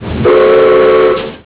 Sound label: train whistle